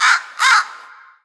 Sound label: bird bird bird; Portal 2